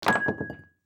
bottle drop

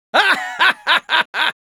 Spy laugh happy, es; Risa feliz 3; Spy responses

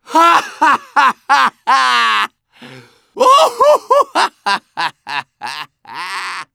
Medic laugh long

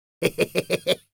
Demoman laugh evil, es

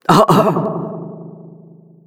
end crash; Announcer; monkeynaut

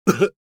pain sharp, es; Engineer; Voice file from Team Fortress 2 Spanish version; Category:Engineer audio responses/es

